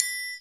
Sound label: TRI, triangles; Univers Sons; Basicussions